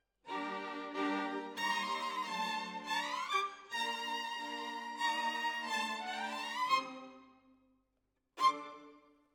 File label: Violins, jazz for piano